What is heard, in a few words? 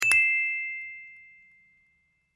correct; 正解の音・ピンポーン